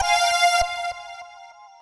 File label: synth; osu